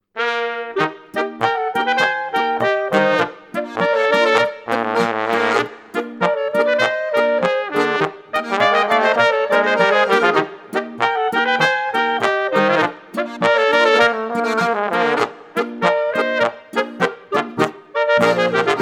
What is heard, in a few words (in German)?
Boarischer